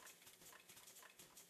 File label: enemy move; Alpha-E-Corp